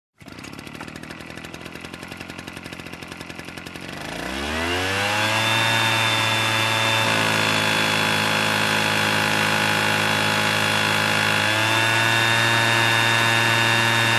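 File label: sound of working garden vacuum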